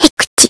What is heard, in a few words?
ram sneeze